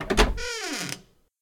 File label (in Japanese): open wood